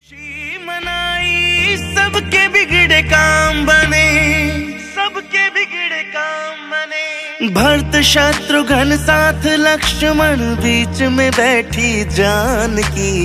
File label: Ram Bhajan